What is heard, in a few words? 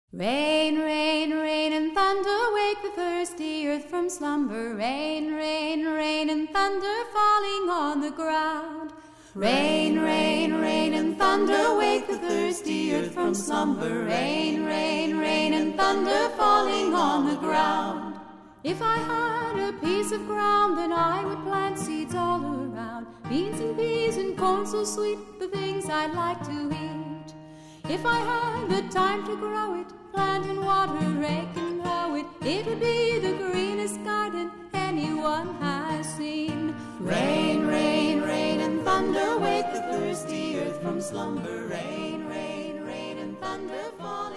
Rain